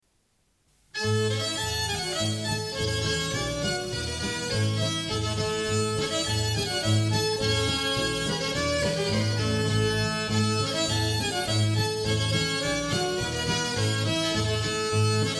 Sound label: Galopp